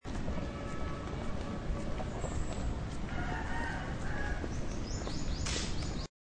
pag apak

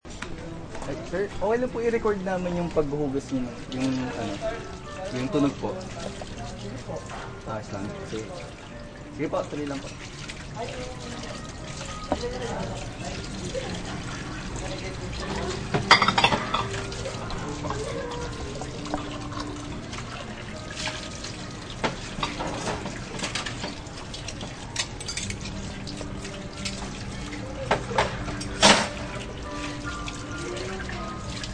paghuhugas ng utensils

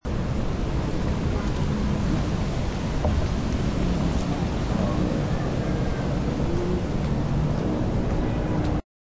paglakad

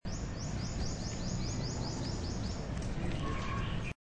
ibon

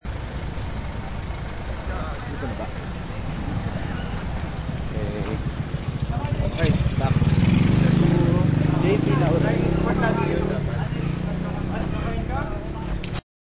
sasakyan

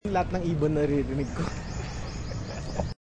ibon

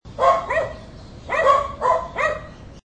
sagutang pagtahol

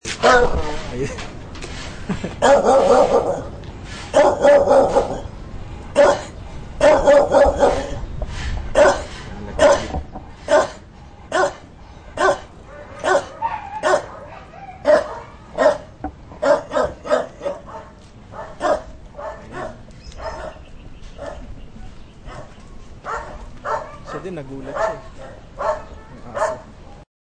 pagtahol

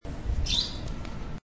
ibon

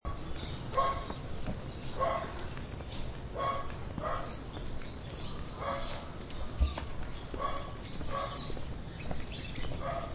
aso ibon lakad palayo

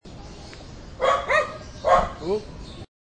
pag tahol left to right

